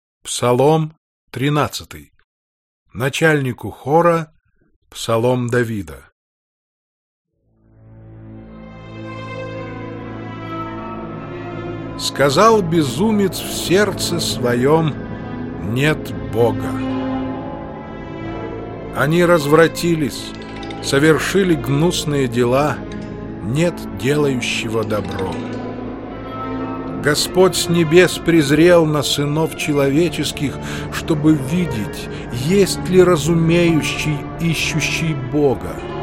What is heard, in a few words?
instrumental mix; ПСАЛТИРЬ, псалом 13, синодальный перевод